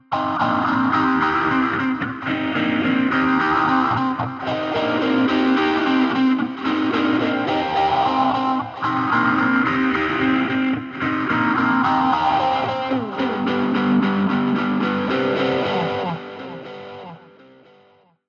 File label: sync bounced delay wet